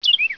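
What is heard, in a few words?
bird; World Racing 2; XBOX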